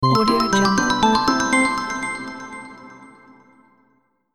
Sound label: bonus complete